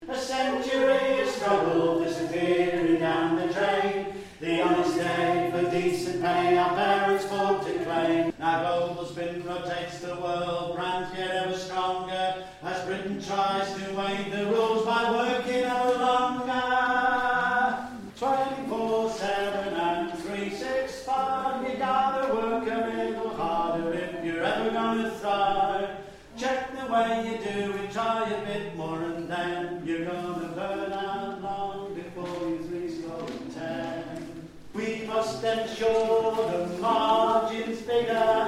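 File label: Soprano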